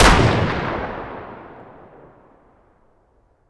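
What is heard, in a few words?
weapon dmr fire player atmo ext; gmod; viper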